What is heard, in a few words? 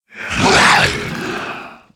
Shadowbeast Attack